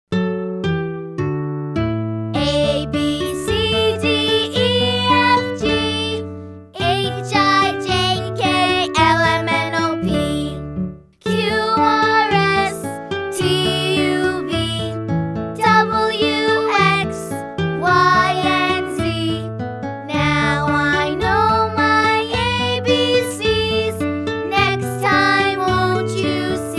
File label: Abc Song; Nick Jr Radio